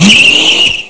car; hardrivin; Amiga Soundtracker Sample Packs

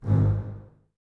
Basses G#1, String Ensembles; Miroslav Vitous; LINKS ORCH

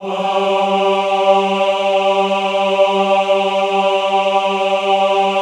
AHH G2, Classical Choir; Best Service; Extended Classical Choir; AHH MIX

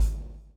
BLOW; Spectrasonics - Backbeat

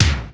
KIK; Hollywood Edge; The Big Bad Beatmachine; XR-10

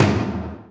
Korg N5 Rock Kit

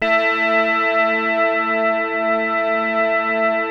PPG A3; Hollywood Edge - Giorgio Moroder Rare Synthesizer Collection; PPG.2.3

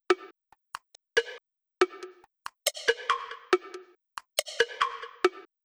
drum Loop 140BPM Perc

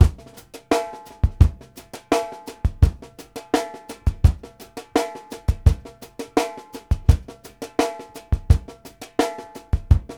FEEL A, drumworks; Sampleheads; New York City Drumworks VOL-1

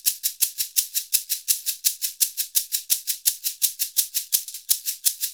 SHAKER, Modern Percussion Loops

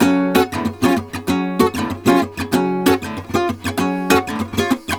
GUITAR, Balkanish Traditions

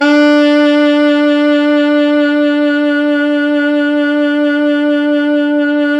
Pop Brass, D3 -L; Best Service ProSamples vol.19; AKAI

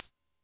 Bead Beg, Alesis D4 drum machine

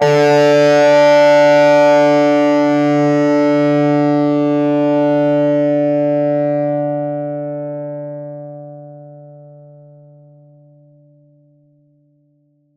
PLK DIST D3, Acoustic and Electric, Plucked; Polyend; Simulacrum Sounds; 1 Shots

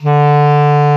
KLARINETT D, Alpine Volksmusik; Best Service

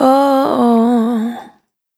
Spin Dry, Single Voice Melodic Loops

